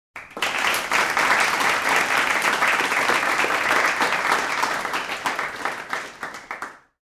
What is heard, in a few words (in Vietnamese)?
Tieng vo tay su kien